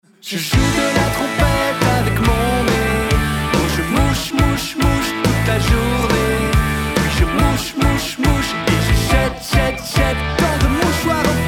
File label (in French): mouche mouche rock